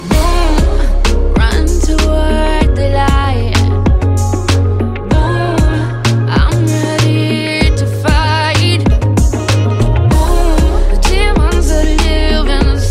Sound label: sound track english ringtone